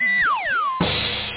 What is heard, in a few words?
man fall; kidsplay